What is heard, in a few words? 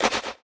Perc, drums; Touch Loops; BlissedOutBeats